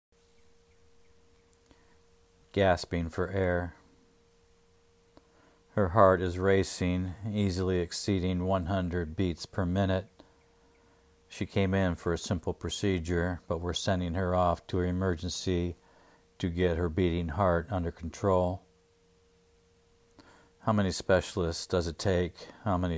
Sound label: gasping for air